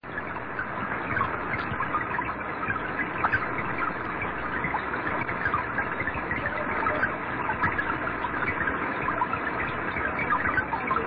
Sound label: rain